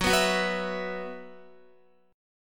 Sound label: Gb 7th Suspended 4th Gb down Guitar, chord